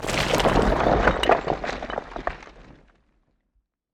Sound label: Debris Step